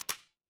ui toggle

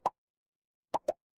cartoon pop

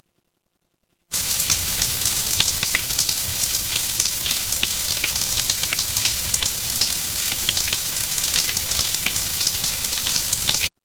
ambience rain

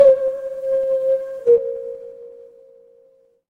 instrument singing bowl

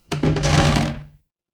door creak

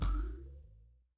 cartoon boing

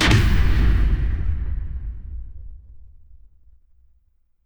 magic scifi laser

